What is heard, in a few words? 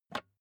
office chair lever up